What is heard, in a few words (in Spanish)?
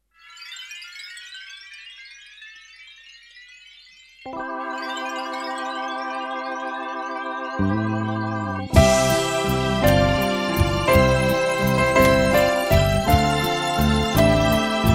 instrumental